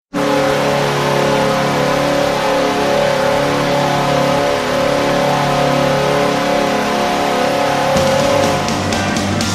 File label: Goal Horn; Predators